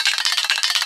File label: air con